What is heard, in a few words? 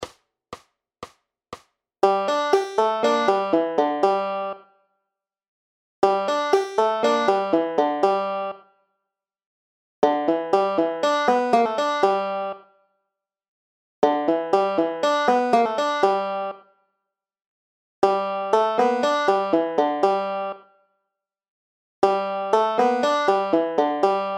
Double Thumb Licks